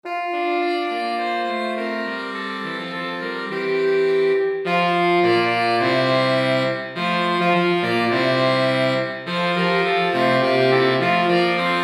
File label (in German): saxophonquintett